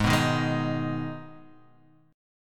Eb Suspended 4th Sharp 5th, Ab

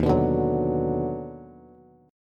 Eb Minor Major 7th Sharp 5th Eb down Bass, chord; EbmM7#5; strummed; JGuitar

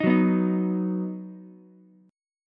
Eb Minor Sharp 5th, Ukulele; Ebm#5 strummed; 8,6,7,6; JGuitar

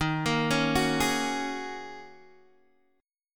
D sharp Minor 11th D sharp Guitar Open D, chord

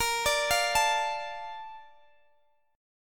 Bb Major 7th, Guitar, Half Step Down

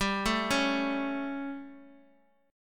G Suspended 2nd Flat 5th G, chord

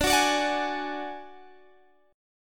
Gb Suspended 2nd Sharp 5th

